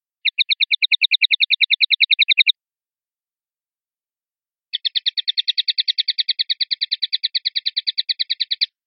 sonaja tateada; pardillo

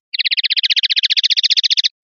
rep torreos, pardillo

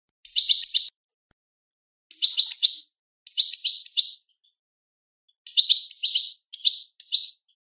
padreos sueltos; campero

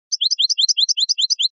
floreo chivi chivi, campero

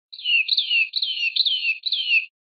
golpes corridos de yunque; verderon

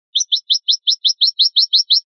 pitadas, pardillo

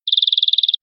rulada

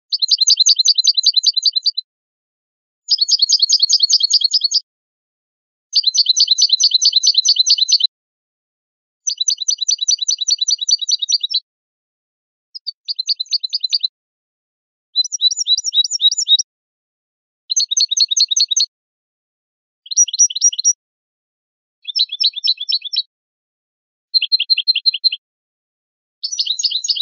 rep bibleos compuestos

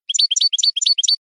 floreo bachi bachi, campero

